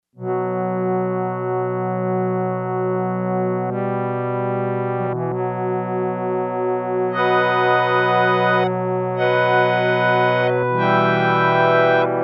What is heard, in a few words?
Harmonium, Yamaha TX802; Performances; Factory Patches